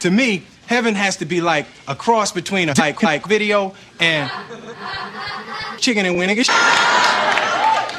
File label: music win ice